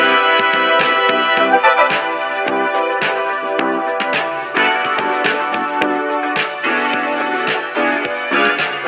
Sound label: keys, Phonky loops